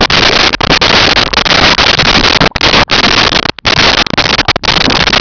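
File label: ambience lava bubble loop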